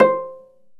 PIZZ BASS C5; E-MU Formula 4000 Series Vol. 2

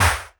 Elektron Monomachine, Drum Machines

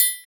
Triangle; Equipped Music; Loungin House

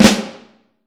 Vintage Snare Drum; 3.5X14; Q Up Arts; Johnny.C's Vintage Snare Drum

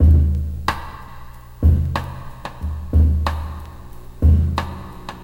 breaks

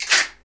mouth, mouth klacks; Roland LCDP14 Africa VOL-2; Foot & Mouth

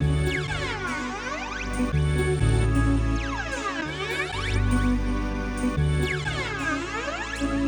Pad Loop 125, Loungin House; Equipped Music

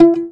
SHUR NOTE, TOPSHUR NT

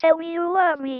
Female vocals and robo-vox

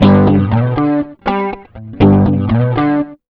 rock, acoustic guitar loops

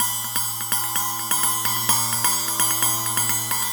PROCESSED CYMBAL GROOVE; Sonic Foundry (Sony Creative Software); Vir Unis Infusion

